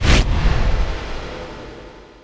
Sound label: blinding light; weapons; dota; keeper